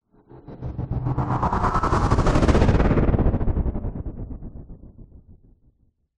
sound design sweep chopper stutter fast dark chorus